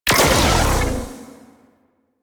Med Charged Shot C; Warframe; Weapons; Corpus; Vandal

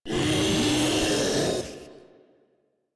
Vocal Attack; Warframe; Proto Infested Ancient

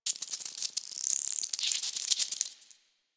Drifter Camp Rock Critter, Creatures, Ambience; One Offs; Warframe; Quests; The New War

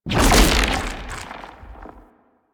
arm turret throw; Warframe; Man In The Wall

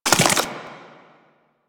Proto Infested Maggot Step; Warframe

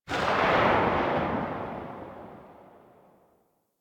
Hand Shotgun Tail, weapons; Grineer

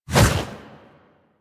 Edge Fist Swipe; Warframe; Warframes; Pacifist

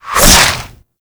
Whip Whoosh; Warframe; Weapons; Infested

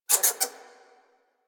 Exterior Forest Critter; Ambience; Duviri; Warframe

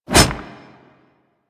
Melee Block Stop; Warframe; Wraith

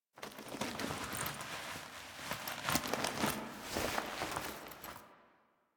Cloth Movement; Warframe; Quests; The New War; Drifter; Foley

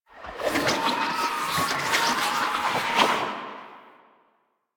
Blade And Whip Idle Spin Whoosh; Weapons; Melee; Warframe; Dagath

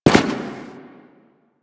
Step Default; Warframe; The New War; Veso

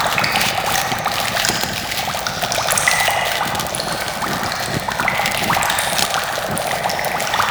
Witch Station Cauldron Loop; Ambience; Soul Plane

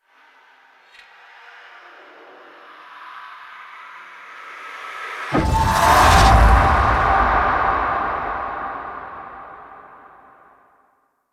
Memory Transition; Warframe; Sacrifice; Sacrifice Arsenal

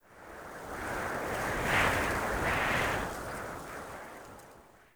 Mountain Wind Gust Whistle Soft

